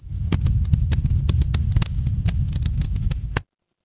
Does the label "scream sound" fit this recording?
No